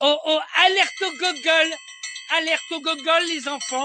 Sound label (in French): alerte